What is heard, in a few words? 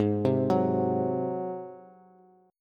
G sharp 7th G sharp, Bass; G#7; arpeggiated; x,11,10,11; JGuitar